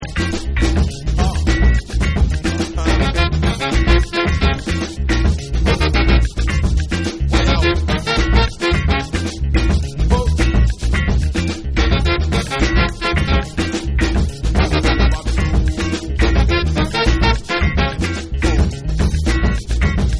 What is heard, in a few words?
AFRO BEAT